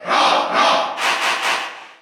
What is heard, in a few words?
Cheer Russian; R.O.B.; SSBU